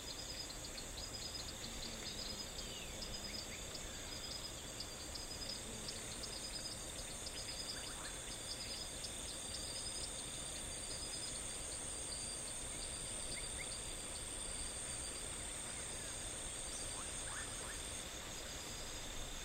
狩猎神殿音效; 2021西路背景音效

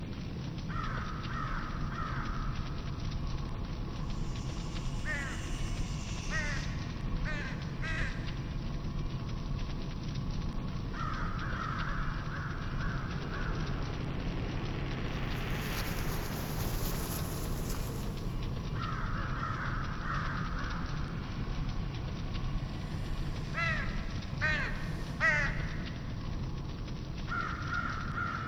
黑暗神殿音效; 2021西路背景音效

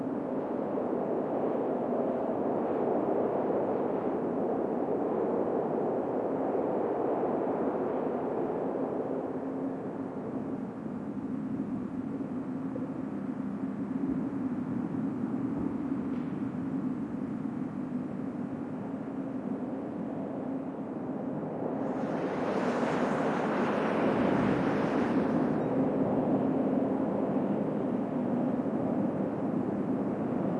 雪地白天

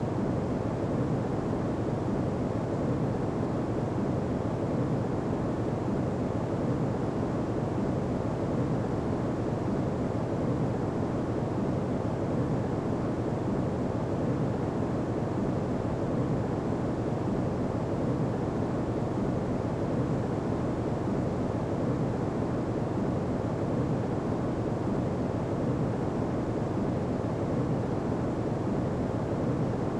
瀑布音效; 2021西路背景音效

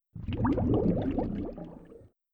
气泡音效; ambience stereo

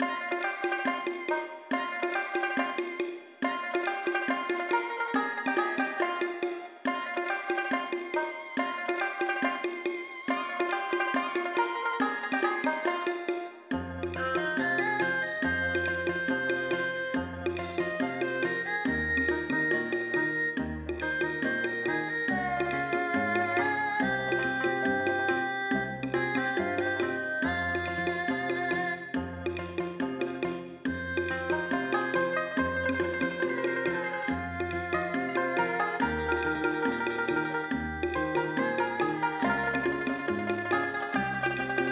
纯音乐; 五星副本; 夏季青丘城前

